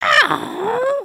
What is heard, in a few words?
raincloud